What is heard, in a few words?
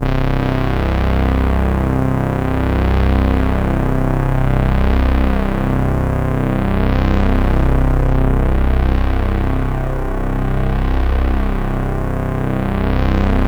C1, Synth Line; 90 s Sample CDs; NiceBeats; SynthLine VOL-1